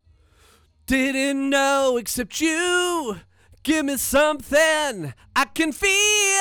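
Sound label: vocal; Pro Tools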